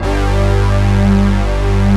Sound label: PERC 5ths